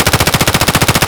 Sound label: kedr loop distant; Escape from Tarkov